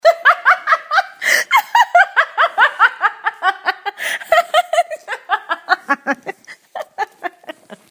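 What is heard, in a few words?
laughter sounds; zvuki smeha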